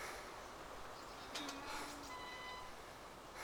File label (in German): gatter; DayZ Standalone